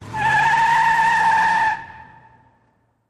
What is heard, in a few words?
Car Peel Out Quick